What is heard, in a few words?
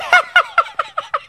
hunter pain; catnap n grabpack; elmo